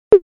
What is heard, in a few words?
Tom, e-drums